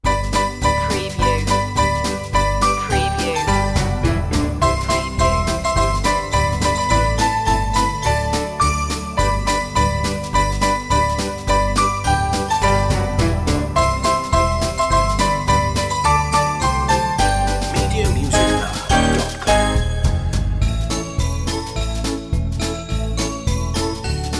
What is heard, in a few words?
jingle bells